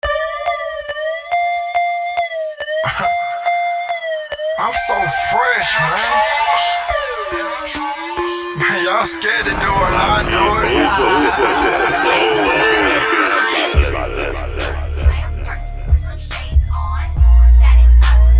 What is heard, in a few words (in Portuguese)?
som automotivo